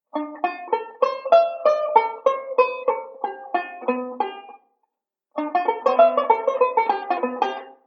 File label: pinky workout, banjo; Beyond Chord Melody Volume II